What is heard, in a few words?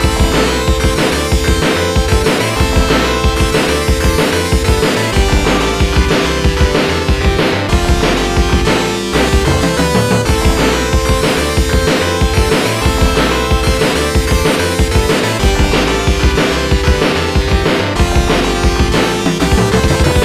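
background music; 1941 - Counter Attack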